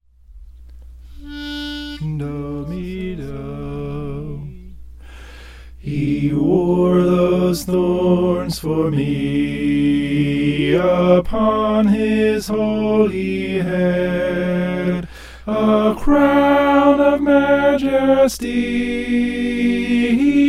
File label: soprano